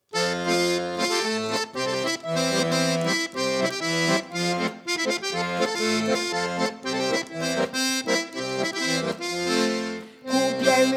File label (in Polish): akordeon; Kupiel mi Jas chustke; z Lomna